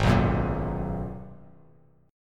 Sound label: Ab Minor 9th down Bass Standard; strummed; JGuitar